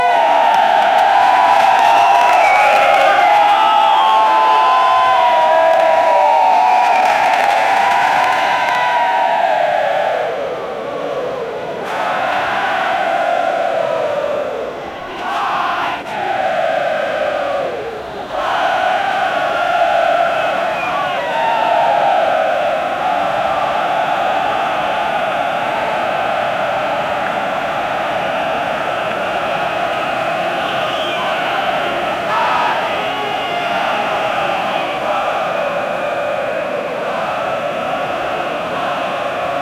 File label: a large packed football s